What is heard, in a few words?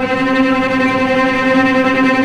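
tremolo, strings; East West - The Ultimate String Collection; VCS TREMOLO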